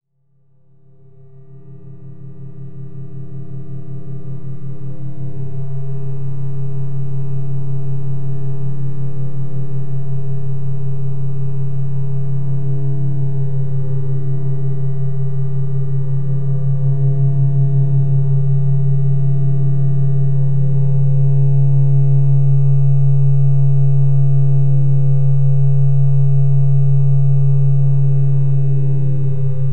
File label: Noise; Rotor R4 Noise Research